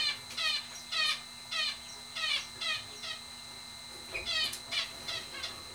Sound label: Female Masked Grass, finch; Birds